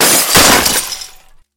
vdrebezgi razbivsheesya steklo

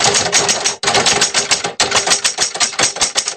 pechatayu na pishushej mashinke

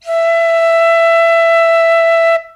flejta odinochnij dolgij visokij

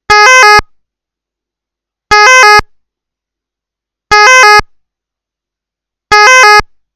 zvuk sireni skoroj pomoshi v kompyuternoj igre

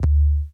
knopka suhaya nizkii shumnii

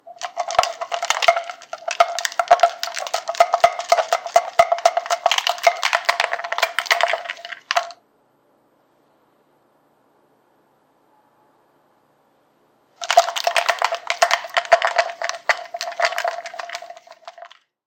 vzbaltivayu proteinovij koktejl pered sportzalom

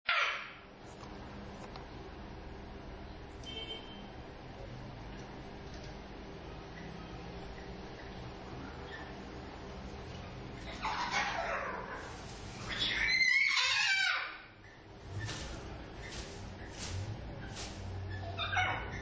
pesni popugaev

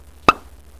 pop korn korotkii odinochnii zvonkii

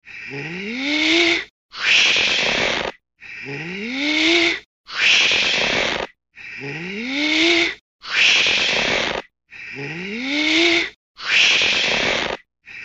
naduvanie vozdushnogo sharika komichno

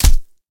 slabij udar nachinayushego sportsmena

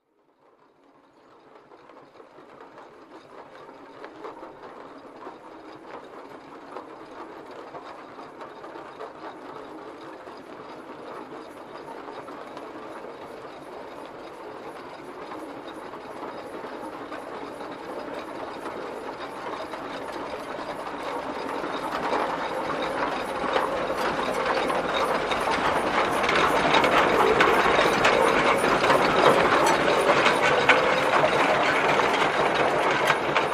zvuki parovogo traktora zvuki priblizhayushegosya traktora traktor proezzhaet sleva naprav; zvuki parovogo traktora zvuki priblizhayushegosya traktora traktor proezzhaet sleva napravo uezzhaet

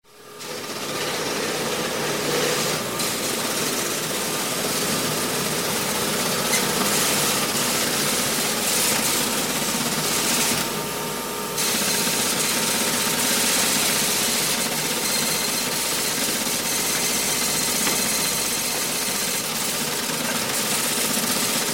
otbojnij molotok

